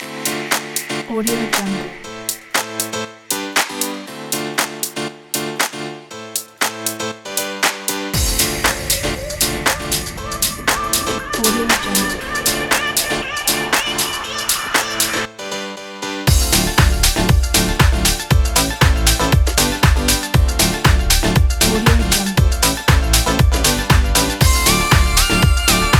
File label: summer house